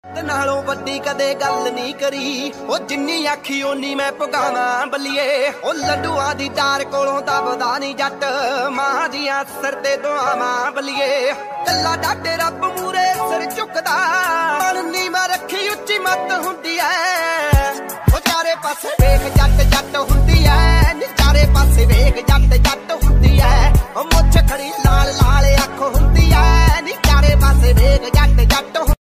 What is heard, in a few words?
DJ Punjabi Ringtone